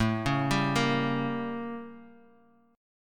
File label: A 7th Flat 9th A; arpeggiated